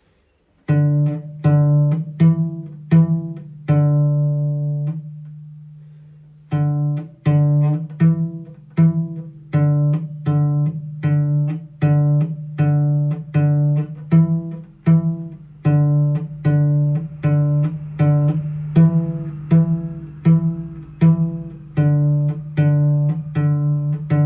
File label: pizzicato, cello